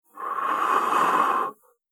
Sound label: Human Noise Man Blowing, breathing; Andrew Fleming